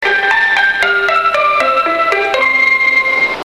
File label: bus chime; 西武バス